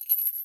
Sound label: chain, step; blocks